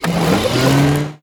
ship designer add utility; Stellaris

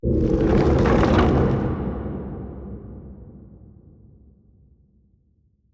barren cold; ambient; Stellaris

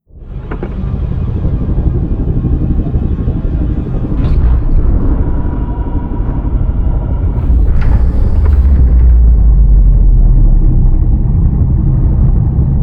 fungoid colossus closing; apocalypse

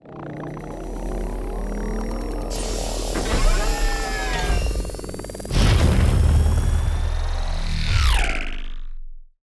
gravity snare deploy; Stellaris; grand archive; ships

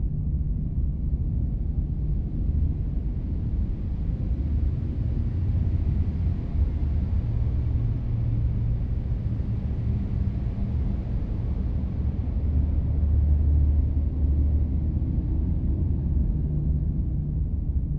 habitat idle; aquatics

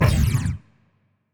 weapon laser muzzle; biogenesis; confuser

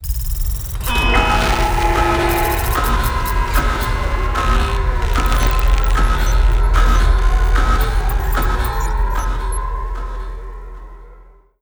worrying signal; Stellaris; nemesis

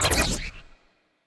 evasion buff projectile, weapons; Stellaris; biogenesis

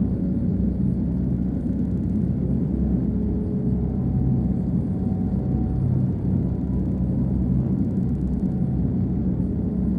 ship laser idle; ships; pre-FTL; Stellaris; first contact

